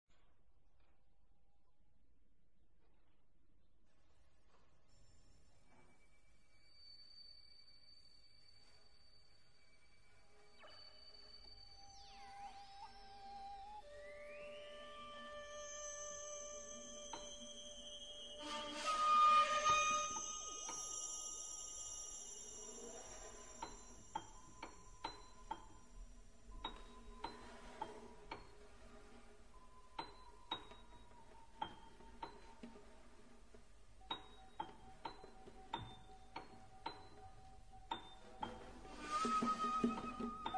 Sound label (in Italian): Raddoppio per strumenti elettronici e percussioni; di Paolo Ferrari; LE MUSICHE DELL' ASTRATTA COMMEDIA